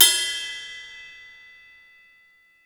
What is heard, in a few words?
STEREO DRUMS